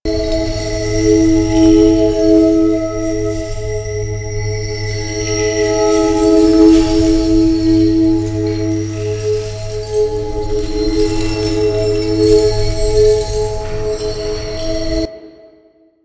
Crystal Defense Loop, Ambience; Warframe; Citrines Last Wish

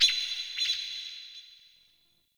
Bird, Ambience, Garden; Eternium Garden

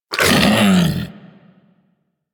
Predasite Vocal Pain; Warframe; Hunting Critters

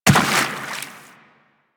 Step Water, footsteps; Warframe; Teshin

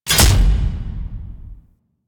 Attack Impacts; Ambience; Golden Maw; Warframe; The War Within

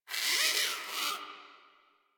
Forest Rodent Vocal Angry Short; Characters; Warframe

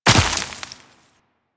Land Veg, Footsteps; Warframe; Tenno

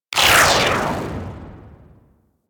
TnT Tail; DE; Warframe; Weapons; Archwing; Powers

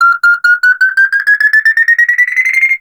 grenade warning; Enemies; Soldiers; Weapons; Warframe; Lasrian; Goonadier

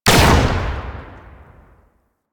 Modular Secondary Barrel Fire; Warframe; SU Modular Guns

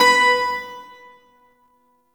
Shawzin Oct Two B; Warframe; Duviri Shawzin

